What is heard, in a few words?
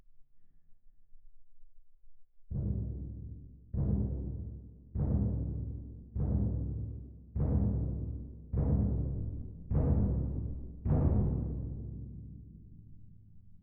Twin R Perc